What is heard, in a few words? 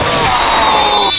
ANIMATRONIC SCREAM HI PITCH